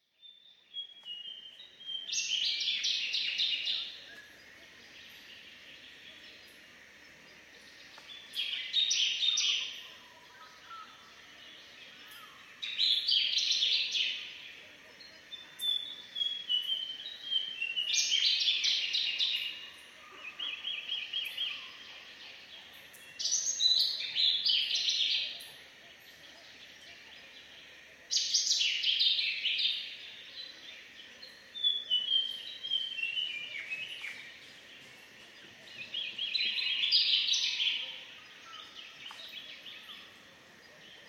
large tailed nightjars chopping calls, birds and wild ducks